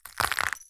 step; tungtung cursed; tungtung sahur curse

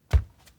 step; brainrot; la vaca saturno; saturnita

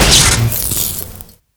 sapper removed; tf2

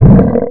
kick horn; the monster plane v2; kolmods melee

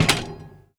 step; skibidiclaw

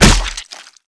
body medium impact hard; Garry's Mod; tf2

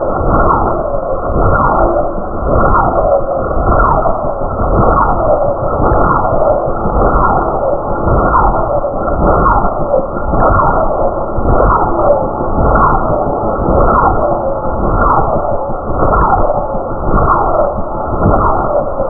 mantis fly; skbt astro fleet

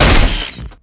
neon sign hit, weapons; tf2

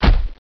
run step, footsteps; vinagre npcpack

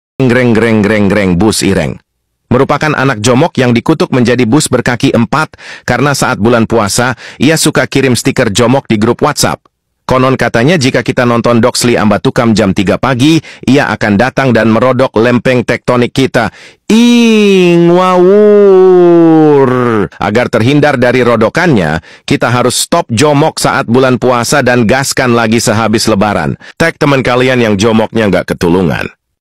loop; reng reng bus

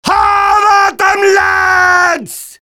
battle cry; demoman

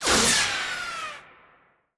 resistance heavy; Garry's Mod; tf2

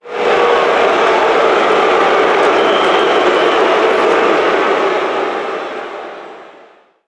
crowd boo; Garry's Mod; tf2; passtime

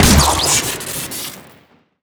bow shoot crit; tf2

